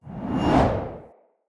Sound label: Long Sword Accent; Weapons; Mother